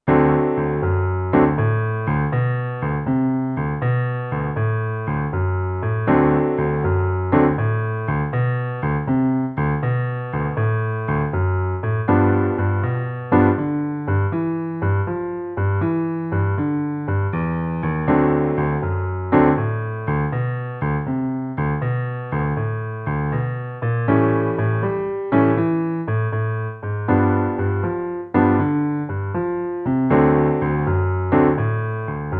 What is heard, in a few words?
boogie lent; MOBICLIC 57